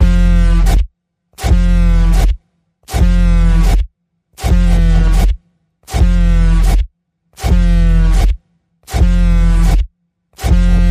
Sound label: Chopping Heads Panning Pulse F, Key of F